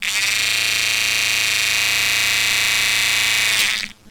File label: shave